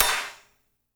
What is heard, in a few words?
HAT SNR; Spectrasonics; Burning Grooves